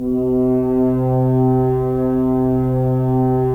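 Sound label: Solo French Horn, Brass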